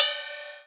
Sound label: cymbal; metronome